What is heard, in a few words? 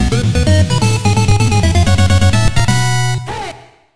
NES stage clear; TMNT3